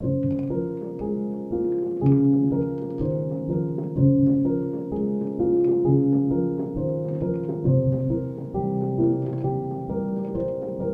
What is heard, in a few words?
Piano